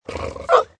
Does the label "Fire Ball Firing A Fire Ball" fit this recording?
No